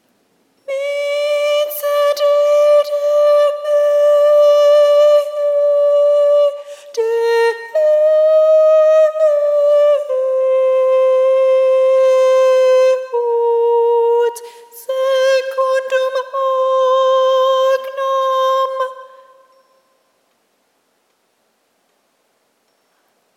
Allegri Miserere 1st Soprano, Rehearsal recordings; Lent/Holy Week; Allegri first page Soprano 1